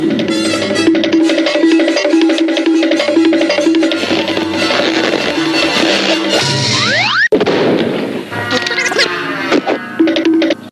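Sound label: Cartoon Background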